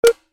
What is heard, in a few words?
Eject over